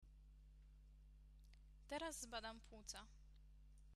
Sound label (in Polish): pluca